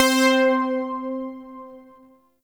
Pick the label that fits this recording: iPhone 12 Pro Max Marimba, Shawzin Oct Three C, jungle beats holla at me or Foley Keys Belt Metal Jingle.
Shawzin Oct Three C